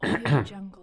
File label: coughing to get attention